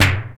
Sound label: FX DRM, Analog; Sound & Vision - Gigapack II